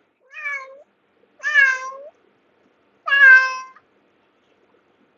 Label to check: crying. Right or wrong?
Wrong